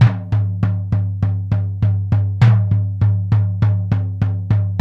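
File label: 90 bpm, American Native; Spectrasonics; Supreme Beats; World Dance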